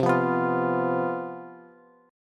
C Major 7th Suspended 4th, down, Bass; strummed

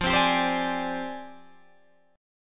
F Minor 6th Add 9th Guitar DADGAD

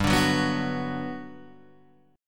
G Major 7th, G, down, Guitar, Open G

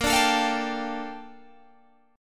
A sharp Major 13th, Guitar Open G

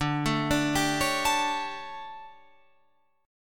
D Major 7th Suspended 4th, Guitar, Open F; DM7sus4; arpeggiated

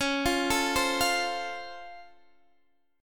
Db Augmented Major 7th Db down Guitar Drop D

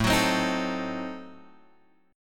A Diminished 7th A, chord shape; Adim7 Chord {5 X 4 5 4 5} strummed

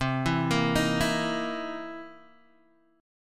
C 7th Sharp 9th C Guitar Open D

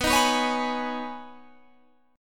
B 6th Add 9th B Guitar Standard, chord; B6add9 strummed

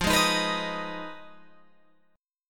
F Minor 7th Flat 5th F down Guitar Open G

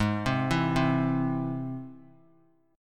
G sharp Augmented G sharp; G#+ arpeggiated; 20,19,19,22; JGuitar

